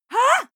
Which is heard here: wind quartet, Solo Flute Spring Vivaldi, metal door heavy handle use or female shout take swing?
female shout take swing